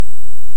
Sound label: Hydrophone; OneOcean